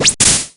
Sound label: open; infinitefusion